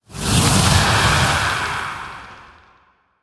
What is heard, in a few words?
Sand Art Elemental Attack